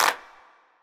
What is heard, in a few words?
clap single hit b key, Royality free hand clap tuned to the B note. Loudest frequency: 2562Hz; percussion single hits one shots